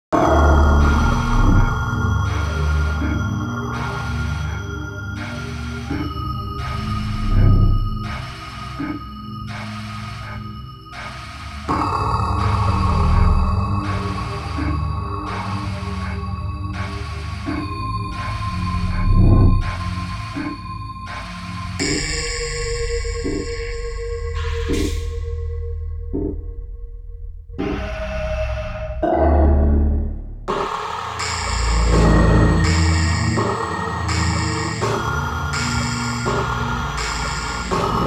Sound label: creepy, production music